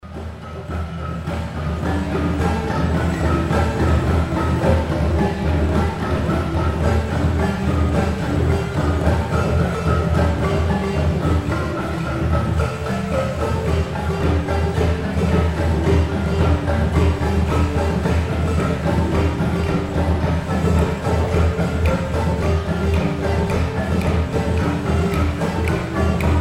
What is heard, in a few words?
Gyil, Ghana West African Drumming and Dance